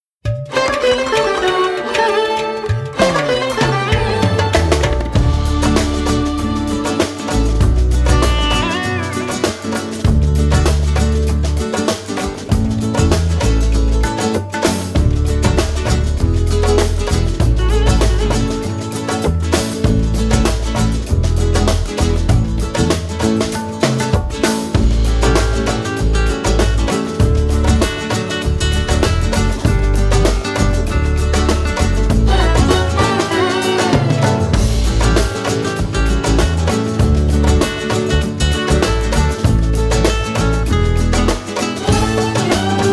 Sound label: O Canada Instrumental